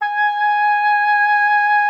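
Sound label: G#5, oboe d'amore; Xsample VOL-7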